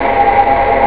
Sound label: human string; ST-XX Amiga Soundtracker Sample Packs